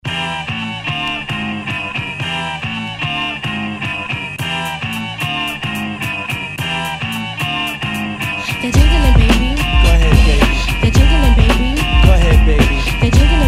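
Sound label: hip hop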